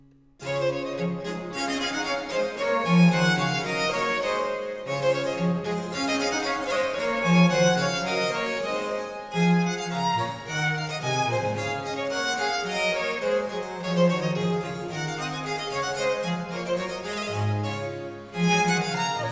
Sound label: Sonata in D major, Gavotte. Allegro, Trio Sonatas; Handel